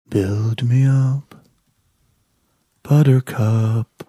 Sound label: singing slowed down sad version